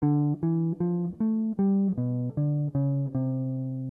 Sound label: bass